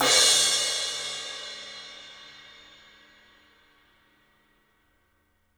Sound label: CYMBALS; 3D Sound; 3D Drums